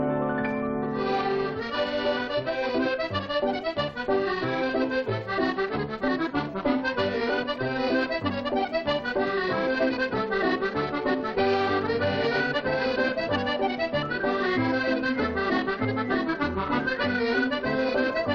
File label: Cuckoo Hornpipe; Paddy O'Brien; Finnegans Players List of Tunes